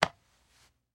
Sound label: Hand Pat Back And Rub Single; Foley